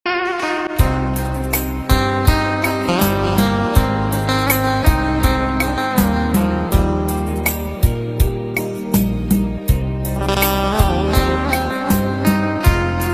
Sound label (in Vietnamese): Hòa Tấu Rumba Không Lời Hòa Tấu Guitar Phòng Trà